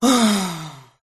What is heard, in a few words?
relief moan tired exhale; lukas tvrdon